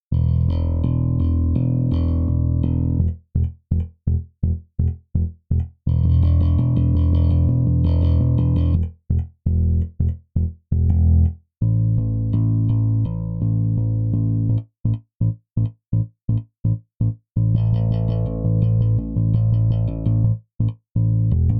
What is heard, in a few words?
Bass finger; BassMan5